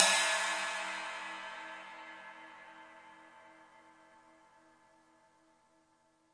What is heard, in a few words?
CHIM, Real Freakin Drum Kit; REAL LIVE KITS